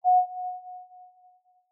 portal beep; Minetest; backrooms

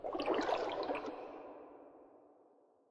water step; Minetest; backrooms

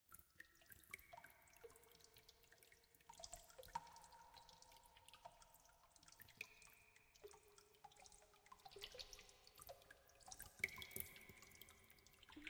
drips and water; Minetest; backrooms; Sumianvoice

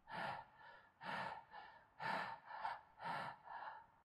stamina low; Minetest; backrooms

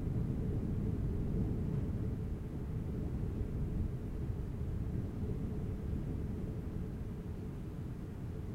wind noise; Minetest; backrooms; Sumianvoice

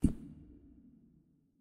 step carpet; Minetest; backrooms

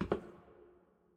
concrete step; Minetest; backrooms

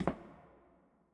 concrete step; Minetest; backrooms

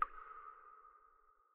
trigger drip; Minetest; backrooms

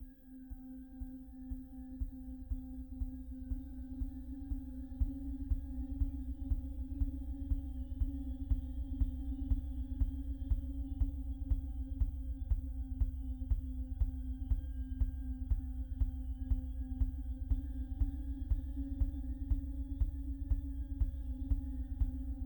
Rhythmic Ambient; pzserver

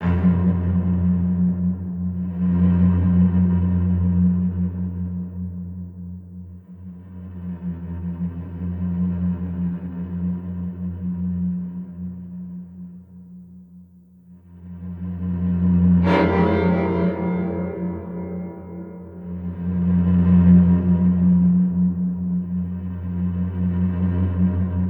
Creepy Ambient, music; pzserver

